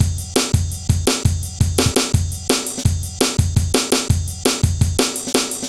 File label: heavy live ride, drum loops